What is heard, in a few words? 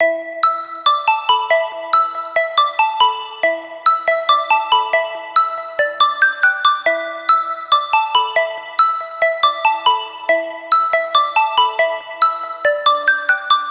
Melody E 140 Raindrop, Color Bass Essentials, Melodic Loops; Ghosthack - Color Bass Essentials